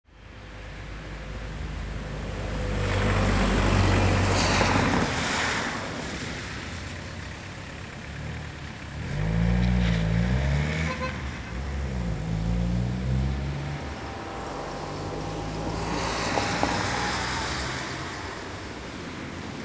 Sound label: City ambience rainy day traffic rumble cars and buses